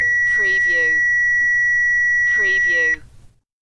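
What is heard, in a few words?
Fax Machine Beep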